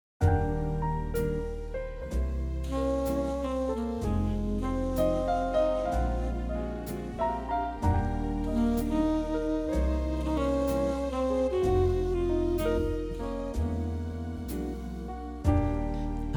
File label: Vocal